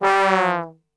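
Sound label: G 1 trombone; Best Service; Brass Super Section; 90 s Sample CDs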